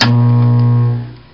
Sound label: rpg ready